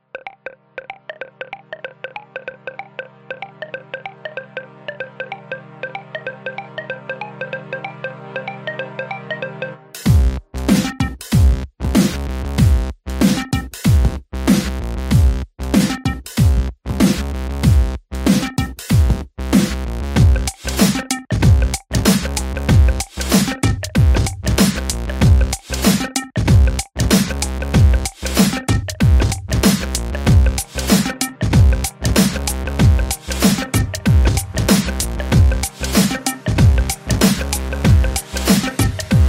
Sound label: GlitchHop Moombahton